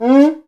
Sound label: PRC XCUICA, Skin Drums